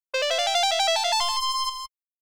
Rescue Jingle BGM

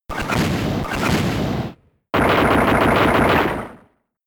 Eruption; pokemon sfx gen 4 attack moves dppl hg ss

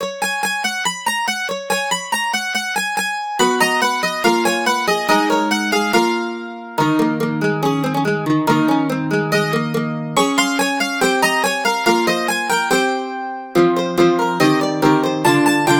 Mandolin

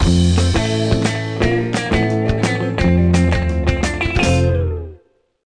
Class Success Stinger; bully scholarship edition

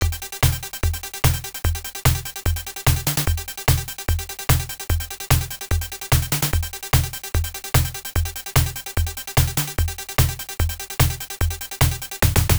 Goal; RoadBlasters; Atari System 1; arcade

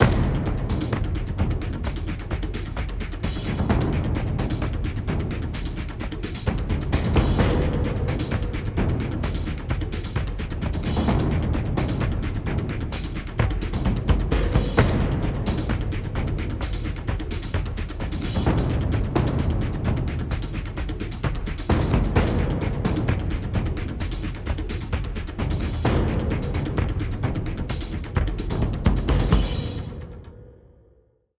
Mus Action Perc High

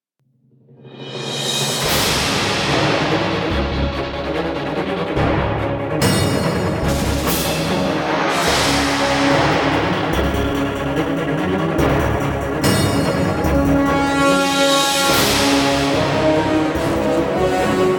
Combat Dogfight; Elite Dangerous

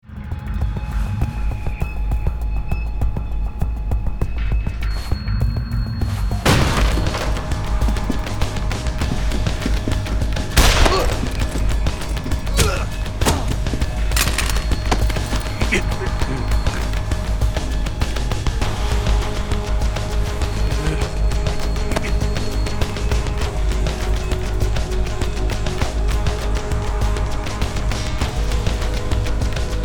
attack sfx music; dxni 545 sandovalattack

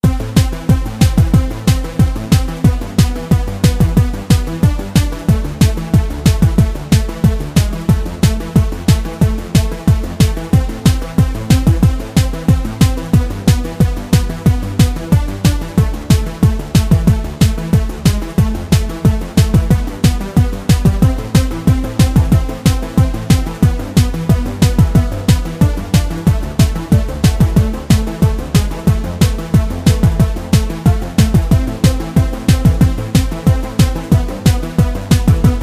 techno drums

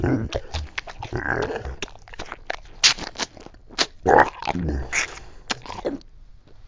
pig eat

